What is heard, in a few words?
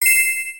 Coin Pickup, ui; aronwk collection; ndaudio